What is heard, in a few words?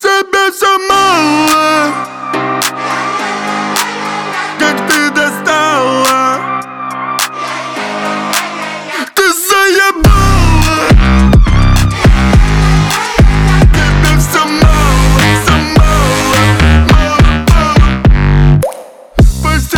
organ